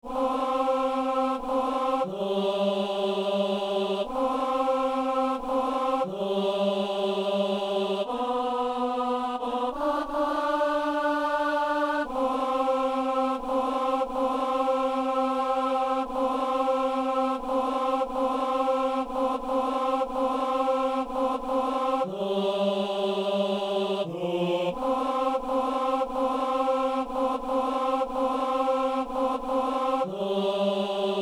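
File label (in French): ténor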